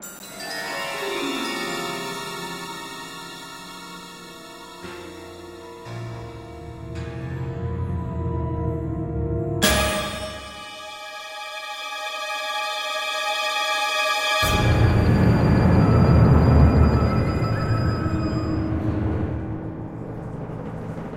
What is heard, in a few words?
Suspense